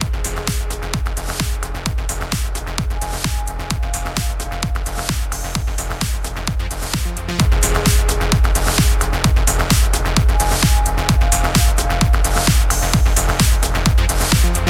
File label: limit